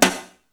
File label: STICK, HI-TECH KITS; 90 sSampleCDs; Crypton - DrumBase5000